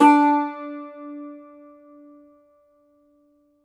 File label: cumbus saz D, plucked strings